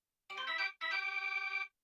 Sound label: token unlock; Spring Fayre; RuneScape 3